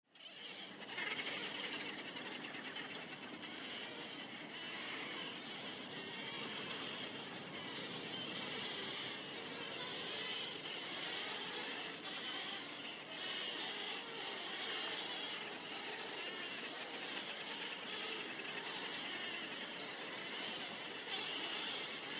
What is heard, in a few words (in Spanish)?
AVES GUANERAS EN ISLA DE PACHACAMAC